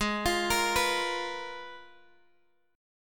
Ab Minor 6th Add 9th, down, Guitar, Open F; arpeggiated

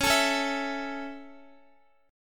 Db Minor Sharp 5th Guitar; Dbm#5; strummed; tuning=4E,4A,5D,5G,5C,6F; x,x,11,14,13,11

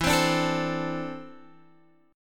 E 7th Suspended 4th Sharp 5th, Guitar; E7sus4#5; strummed

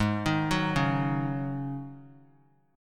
G sharp 7th Suspended 4th G sharp; G#7sus4 arpeggiated; 20,22,21,23; JGuitar

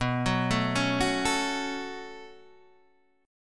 B 7th Suspended 2nd, Guitar, Open D

